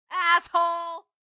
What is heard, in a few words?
car reaction; Blind Drive